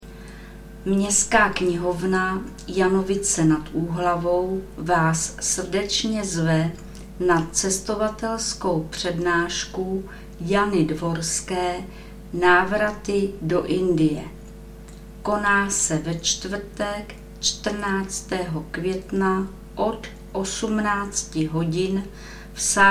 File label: Indie